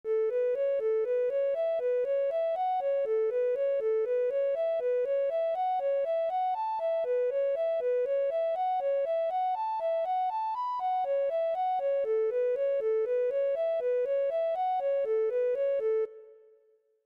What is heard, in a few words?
recorder